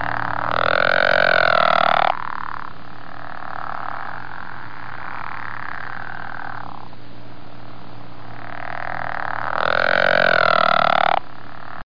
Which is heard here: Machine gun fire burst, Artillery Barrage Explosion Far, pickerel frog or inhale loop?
pickerel frog